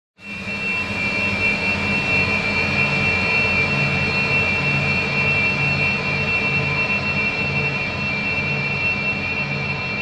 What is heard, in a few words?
science fiction atmospheric long loud burst drone machine like